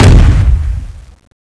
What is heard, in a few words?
walk; CSO LightZombie